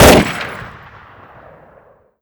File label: mg42 shoot; weapons